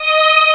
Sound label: strings ds5